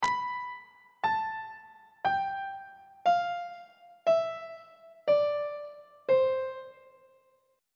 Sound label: b sharp ionian mode descending on treble clef